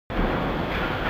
Ambient - Machinery; mother 3

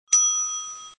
ding; Gun Mayhem 2

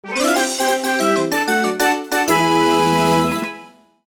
Victory!; super mario odyssey

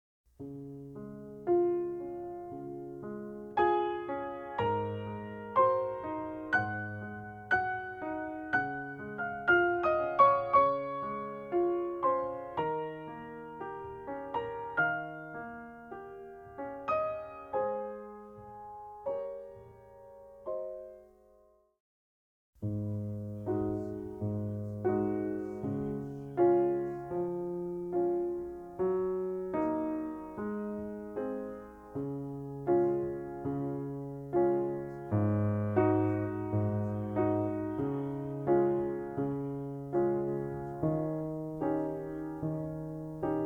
Piano; Assassin's Creed Syndicate

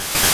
Sonicboom Single; Pokemon; Gen 1; attack moves; RBY

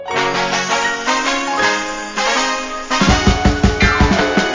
bgm raid box gacha intro

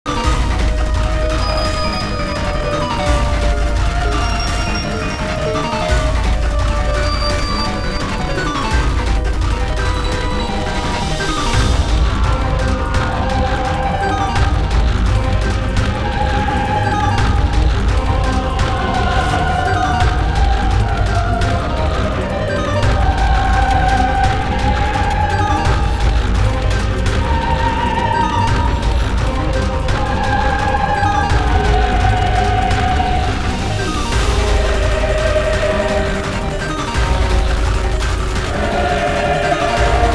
Fight Dark Room

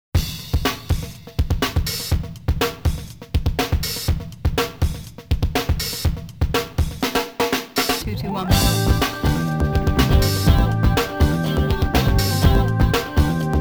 Stormy Saxophone; snk characters sounds collection volume 11 iori yagami; KoF 95 OST

